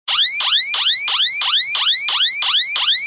Siren; bobtherobber2

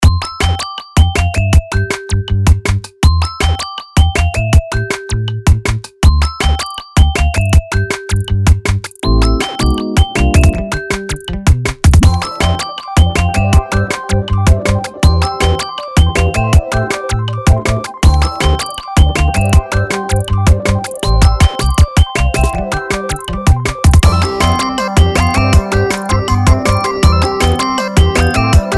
ringtone, music; fridaynightfunkin; girlfriends

